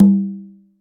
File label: mid tom g key; tom drum